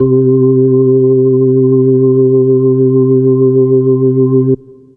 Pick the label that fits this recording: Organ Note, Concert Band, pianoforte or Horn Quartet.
Organ Note